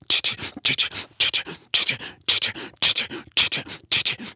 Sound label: African Mouth Groove; Continental Drift Aftershocks; Vocals